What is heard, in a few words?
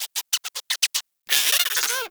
Forest Rodent Vocal Captured Idle; Hunting Critters